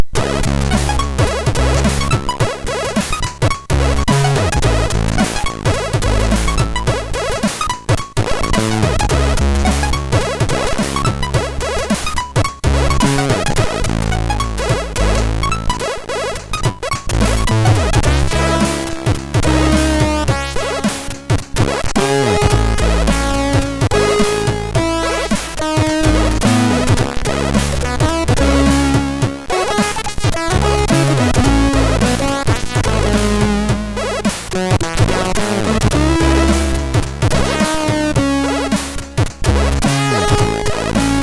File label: Drumz, sid MOS6581R3; Morton Adam